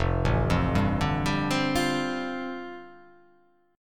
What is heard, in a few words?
F 6th Add 9th F down, chord